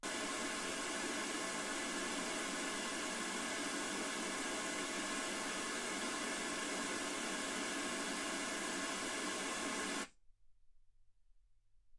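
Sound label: white gaussian noise 48kHz; single mic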